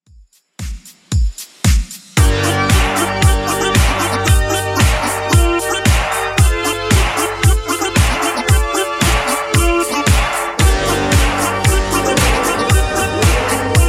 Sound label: 80s Redrum 114bpm